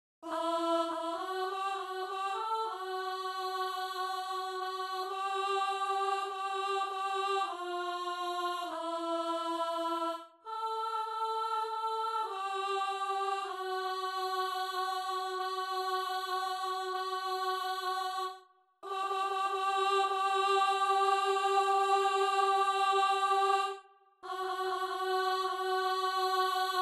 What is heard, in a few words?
crucifigatur contralto